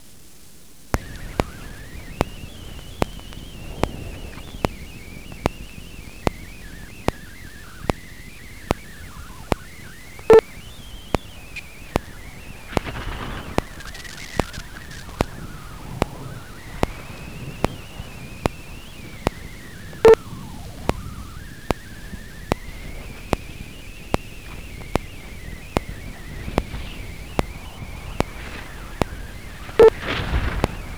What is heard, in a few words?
Vostok mag; arctic antarctic